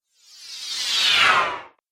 Ghost Whoosh Appear And Vanish; Andrew Fleming

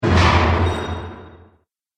Dungeon Atmospheric, scary soundscape

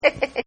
Laugh; Laughter; Sound Effects:Voice; Partners In Rhyme

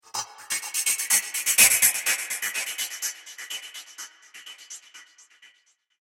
Droid Dance, robot; Robot Sound Effects; science fiction sound effect; Andrew Fleming; Twistedtracks

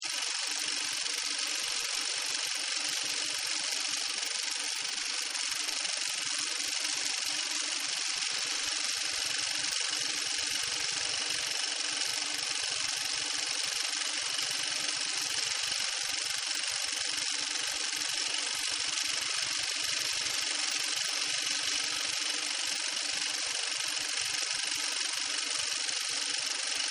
Film Projector; Projectors; Offices; Partners In Rhyme

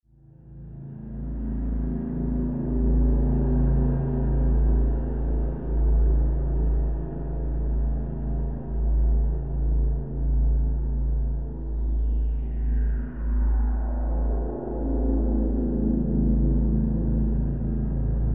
Drone Dark Low, Horror Drone Dark Low; Horror Drone Dark Low Abysmal; Scary Tones; Blastwave

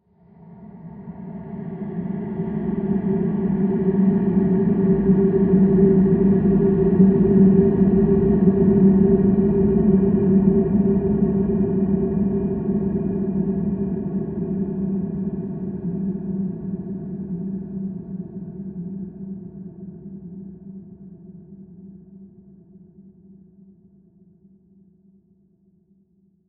VOCAL SWELL, Swell Ghostly Long; Monster; Monsters; Production Element Imaging Element Accent Transition

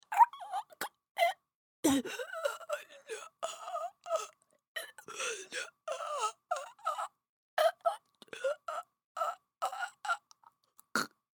Human Female Choking; Gagging; Voice

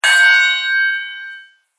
Boxing Fight Bell Single; Boxing Sound Effects; Boxing (Sound Effects:Sports)

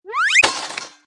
Cartoon Slip Crash; Comedy/Cartoon, Slip: Slide on slippery surface; Cartoon Sound Design

